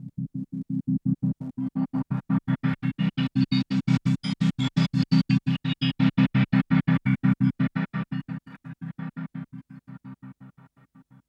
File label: Gated Pad, Drum & Bass Melodic Synth